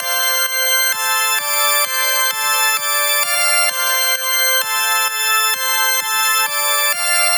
Origin, electric jazz

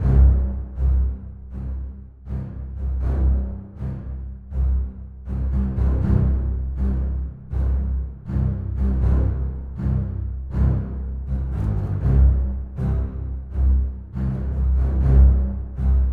Bass String

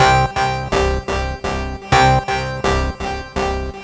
Broadband; Сэмпл синтезатора (Minimal-Electro); 125 BPM; Synth; минимал

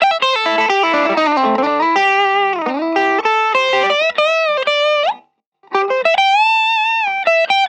Guit Solo

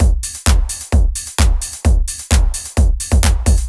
Bryggeri Drums C, сэмпл ударных евродэнс

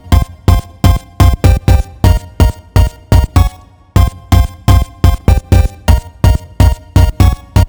Hit Syn, break beat

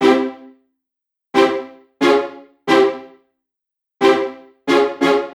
Spiccato, strings

